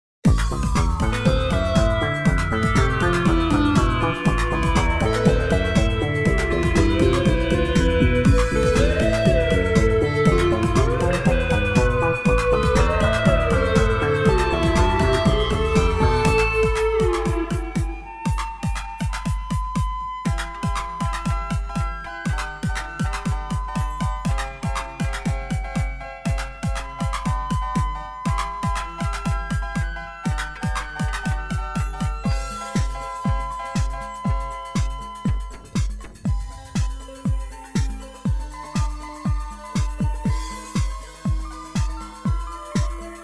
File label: instrumental